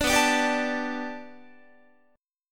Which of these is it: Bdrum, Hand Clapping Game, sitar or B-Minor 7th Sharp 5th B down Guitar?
B-Minor 7th Sharp 5th B down Guitar